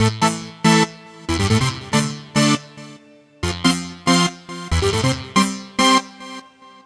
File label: TECHNO SYNTH 140; Keys